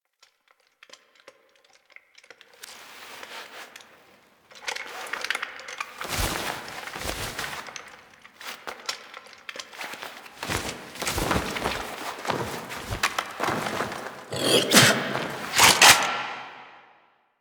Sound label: Shotgun Foley Ending Get Up; Kahl; Warframe; The New War; Grineer Eidolon Shotgun